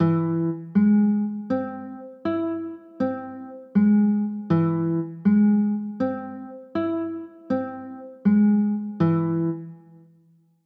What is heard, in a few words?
a flat aug, chords